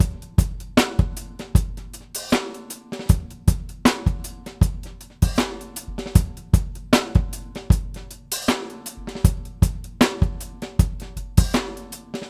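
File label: Shuffle 78 bpm, beat loops; Zero-G; Monster Beats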